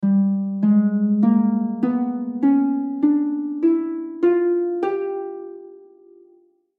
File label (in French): Harpe